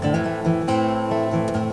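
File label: guitar a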